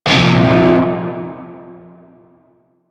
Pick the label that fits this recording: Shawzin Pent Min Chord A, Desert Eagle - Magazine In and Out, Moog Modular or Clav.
Shawzin Pent Min Chord A